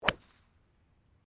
GOLF DRIVE DRIVER; Various Golf sounds; Sports

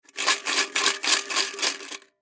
Tin Small Coins, Tin Full Of Coins Shake; Shaking; Foley; Sound Effects:Objects; SoundEffects-Blastwave

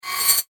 Bright Metal Reverse Whoosh

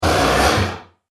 Horror Beast One Shot, monster

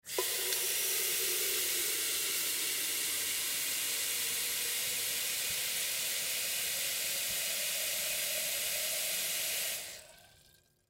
Teapot Fill, Teapot Fill With Water Tea; Tea Kettle; Household; Dishware

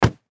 Impact Human Body Punch; Punches and Hits; Combat; Sound Effects:Combat; Blastwave

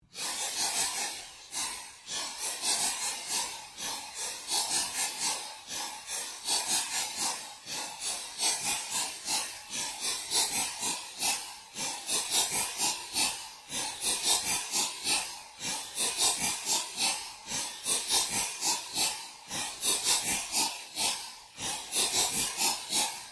Created Fluctuation Drone, Drone Sound Effects; sound building blocks created through manipulation of raw material

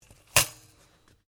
RIFLE REMMINGTON700 7.62MM BIPOD UP; A selection of domesttic firearms; Rifles; Guns

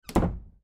Door Solid Wood, Door Solid Wood Close; Household; Miscellaneous Doors; Sound Effects:Doors

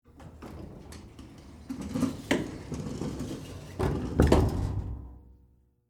Elevator Door Close, Office, Exterior; SoundEffects-BlastWave

